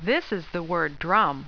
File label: drum; Tandy Sensation II